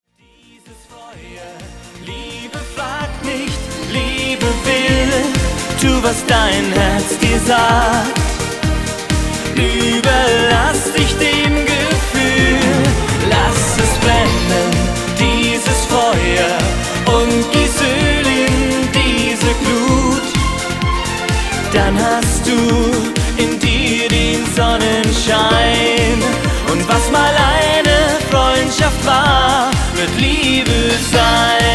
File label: radio version